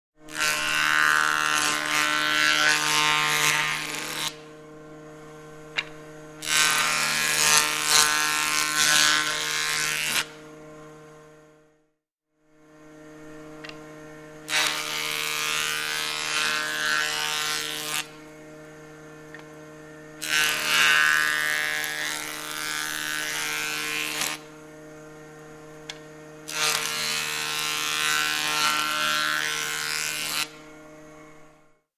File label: Carpentry Machine Wor; construction small